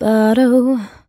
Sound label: Stabs